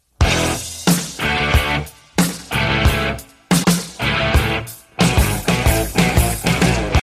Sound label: rock background music tone; rock stone